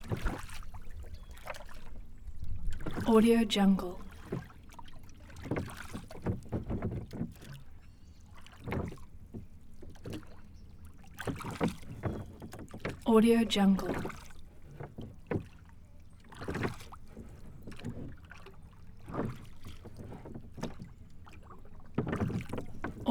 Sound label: rowing constant